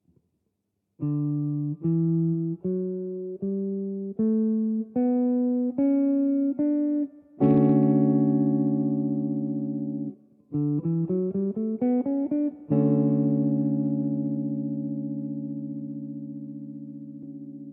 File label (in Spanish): Jonico re